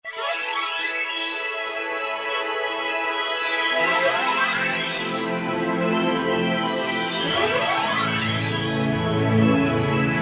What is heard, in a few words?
Fx-Dolphin Dance, Yamaha EX5R; Factory presets